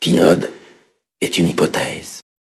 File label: jingle